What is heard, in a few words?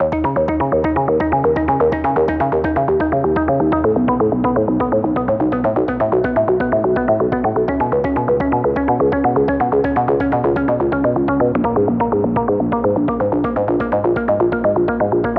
Deep Minimal 125bpm